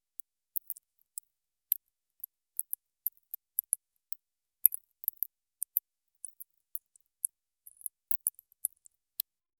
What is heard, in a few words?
a gothic atmosphere; blood